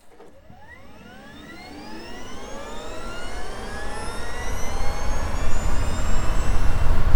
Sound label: i3 bmw better mic close to motor